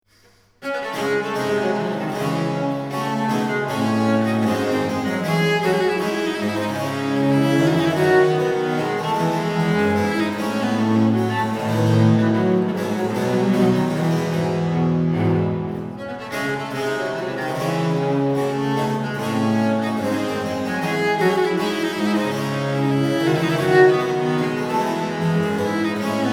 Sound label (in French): Suite En Re Mineur; IIe Livre